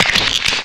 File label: bonebreak